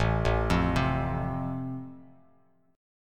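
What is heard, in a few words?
A Minor Sharp 5th, Bass Standard, chord strum; Am#5 arpeggiated; JGuitar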